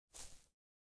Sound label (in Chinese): 猫咪消失